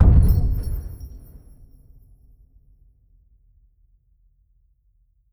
ui skills stop; perks

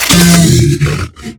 bear injured

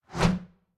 swing blade 2 hand

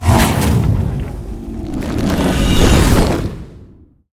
alteration dragonhide charge; skyrim

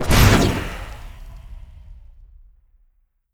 illusion fury

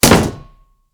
shield heavy block small

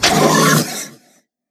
draugr injured

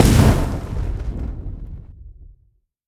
firebolt impact

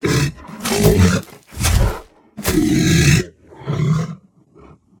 bear idle rooting

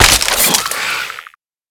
skeleton injured

